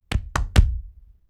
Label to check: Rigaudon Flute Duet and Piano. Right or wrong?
Wrong